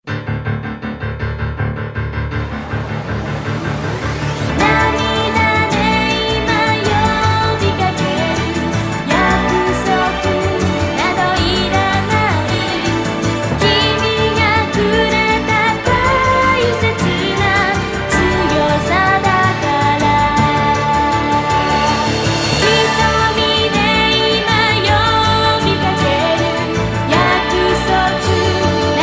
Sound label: lullaby; scaflowne